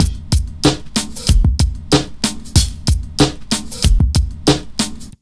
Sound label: BEAT L, Pure Electro; USB Soundscan vol.03; AKAI